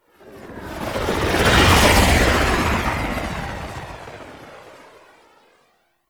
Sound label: Blue Sky Liset Fly By Slow, vehicles; Warframe; Liset Flight